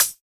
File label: Closed HH